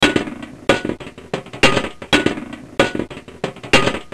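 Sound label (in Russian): звуки бомбы и гранаты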